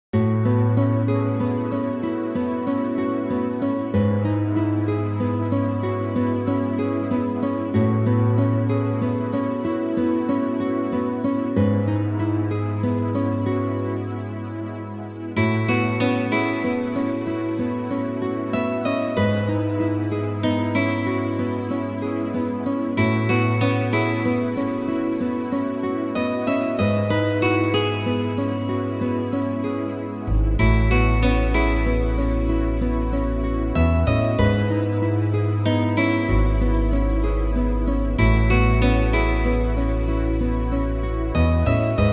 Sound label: Bright Crystal Acoustic; Hotel Dusk Remastered OST